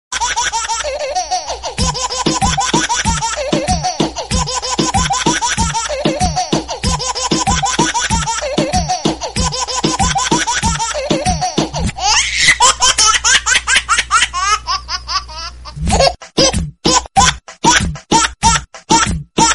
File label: dj baby laughing ringtone; english ringtones